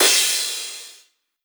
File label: Cymbal, 909 Cymbals; Slate; Vintage Drum Machines Volume 3 909 Edition